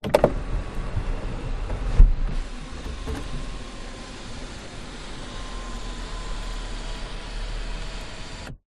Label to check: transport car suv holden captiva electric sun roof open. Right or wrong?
Right